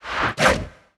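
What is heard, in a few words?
goblin swordsman attack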